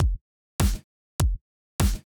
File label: Drums, 100BPM